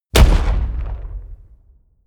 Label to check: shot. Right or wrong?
Right